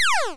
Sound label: fire; DelphiArcadeGames; AlienInvasionStarter